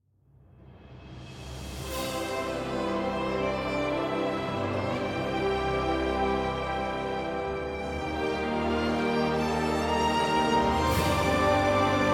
Stinger Gameover Positive Full Orchestra

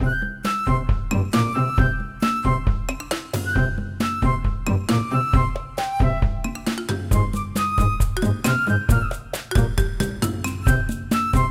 Bgm Game Music; Farm Mania

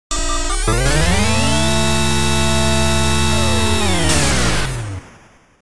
sound of door opening on the 5th floor; Elle; PC-98; 5Fのドアが開く音

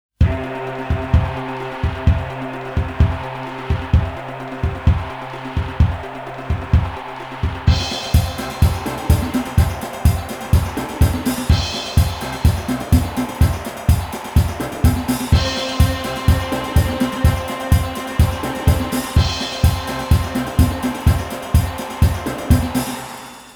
Continue BGM

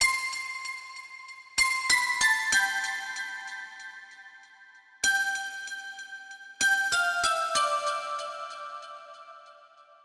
High bells; Vostok Inc.

